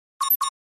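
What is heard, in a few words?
Flash Beep Double Buzz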